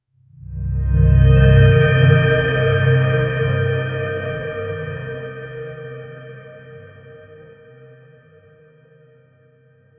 startup sound dark, texture; ps2

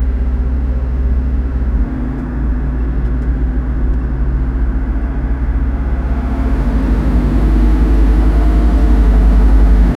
car engine intimidating d; automobile

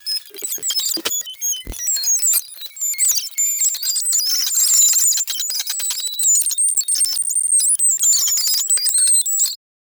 mechanical cybernetic bir, bird

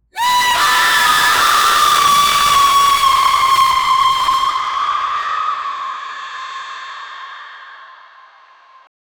short horror jumpscare a, scream; sound effect

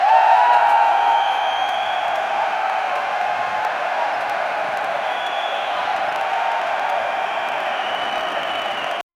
looping ambient cricket

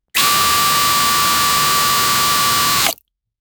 a short powerful vacuum, vacuum

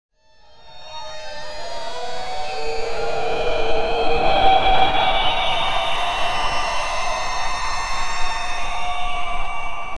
sci-fi street noises

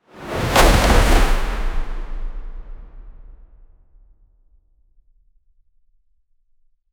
fast wooden object throw; physical

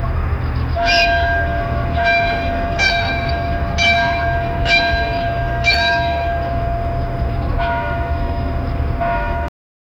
medieval harbour, ships

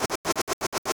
rhythm

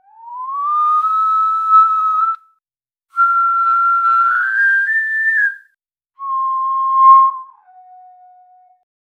a long sliding whistle so

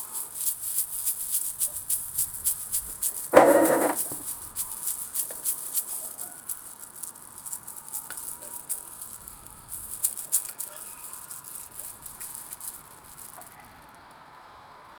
the sound of rice, agriculture

